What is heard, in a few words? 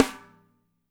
PIC SN, DRY KIT; Spectrasonics - Burning Grooves CD2